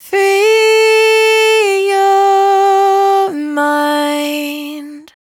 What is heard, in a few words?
hook 135 Dm, Dry Vocals; Raw Vocals; Free Your Mind; Slate Digital Omnivox Vocal Sample Pack